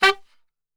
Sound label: G 2 SAX SHOT